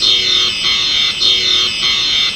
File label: Warning